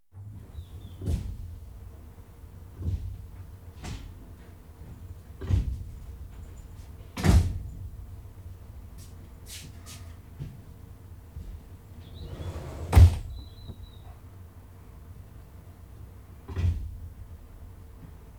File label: Electric Trains Doors opened; The BBC Sound Effects Library